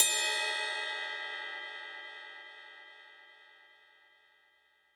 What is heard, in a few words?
Ride Ring Mid